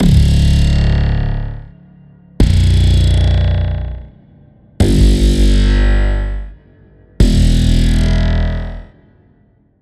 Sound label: Laser Impact; SFX; Routed Sound; Spire Expansion; Quantum Enforcer Vol.1